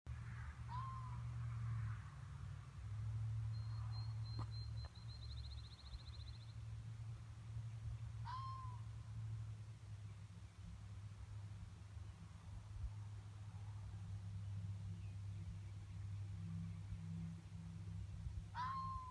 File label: Fox Bark